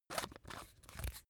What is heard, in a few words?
razor battery compartment screw down